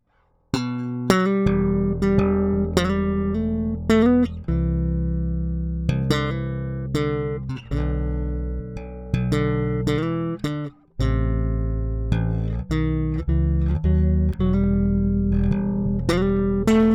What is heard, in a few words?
Dry Mix Slap